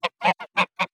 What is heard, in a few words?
poultry goose clucking